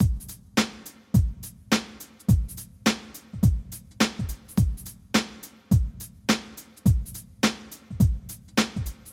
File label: Hip Hop Beat